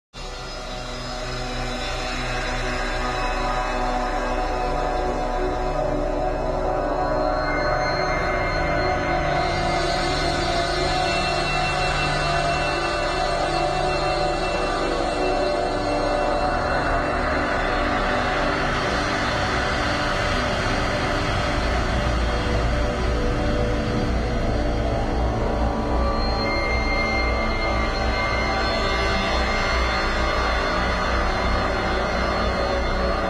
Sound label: Dark Epic Ambient Cinematic; Ceres